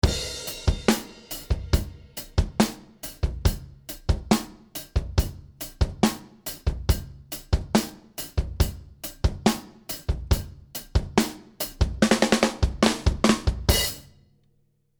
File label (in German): Klark Teknik 1176 KT Drums 12 zu 1